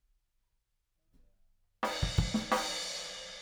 Room

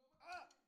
Tom

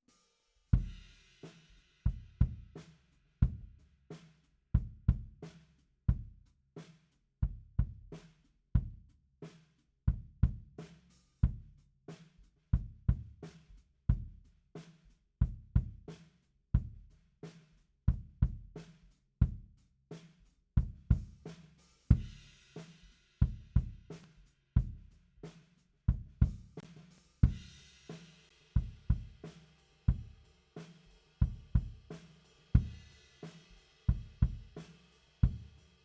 BD OUT

